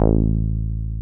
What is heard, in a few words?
303 BASIC A2; Drums In Your FACE!